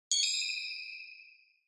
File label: Single Accent Multi Short